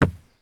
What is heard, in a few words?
sprint wood; Escape from Tarkov